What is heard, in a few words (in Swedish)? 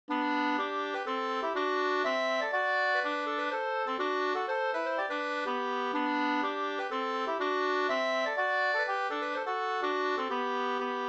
hamburska